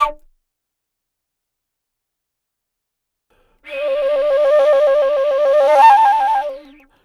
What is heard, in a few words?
Wood Flute, Asia; Sonic Foundry (Sony Creative Software); Continental Drift Aftershocks